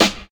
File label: good snare drum c key